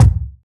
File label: good bass drum single shot e key, kick single hit tuned to the E note; kick drum samples